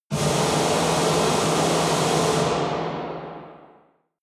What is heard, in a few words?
air flamethrower